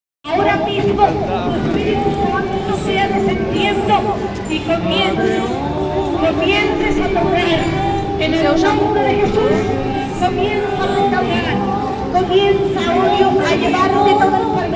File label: Salsa Picante; Masterbits; World Zone VOL-3